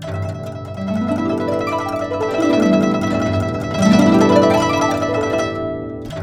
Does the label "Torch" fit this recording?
No